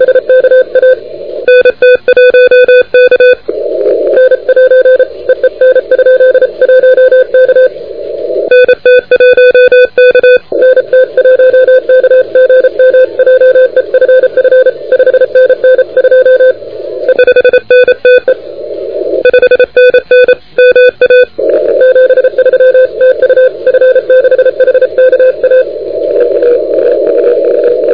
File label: CW 28MHz; ARRL10M